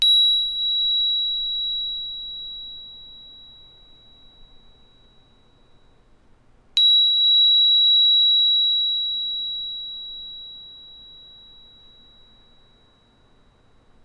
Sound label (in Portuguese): Pin Si, instrumentos de quartzo; escutar instrumentos de quartzo